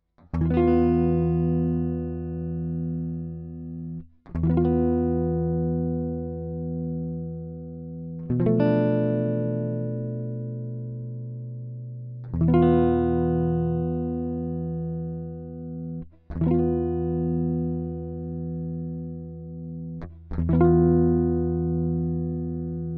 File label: 112 gtr chords dry